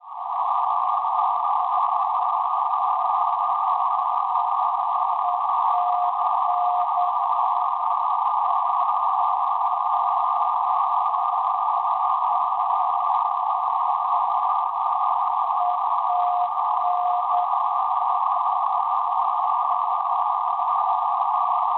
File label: NDB Etain, Francia 410 kHz; ETN; Schwarzsee